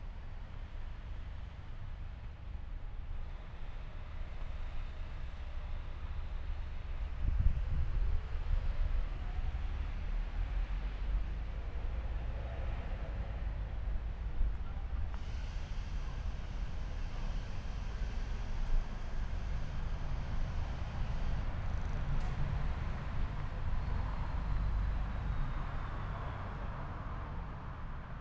London Elephant and Castle walking traffic noise voices